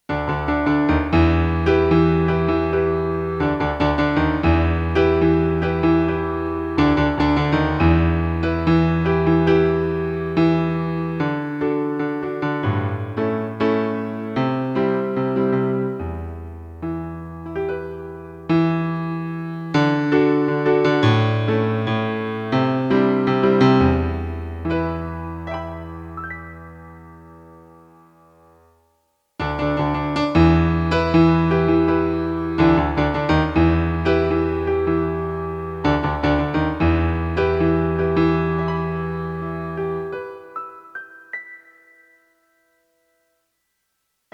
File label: piano